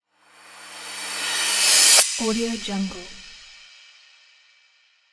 cymbal transition

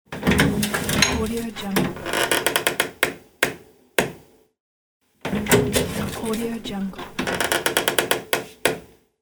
doors

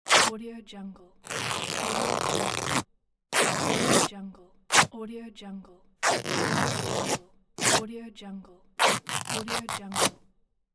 cloth tearing; welltone

